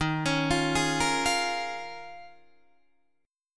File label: D sharp 9th Suspended 4th D sharp, Guitar DADGAD